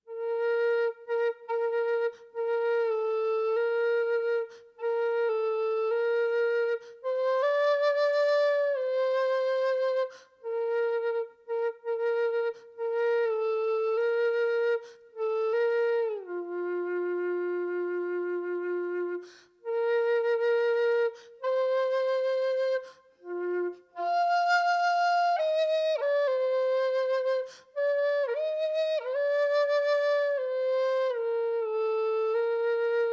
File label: whistle